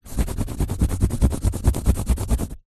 office pencil scribble scrub out on cardboard sheet